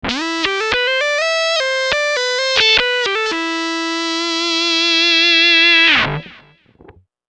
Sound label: Perfect Scratch